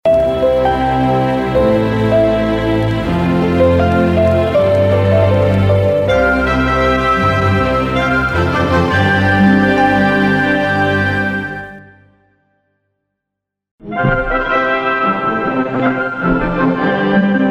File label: Radio jingles; Southern Sound Radio Mercury; Programme Share Jingles (Southern Sound & Radio Mercury)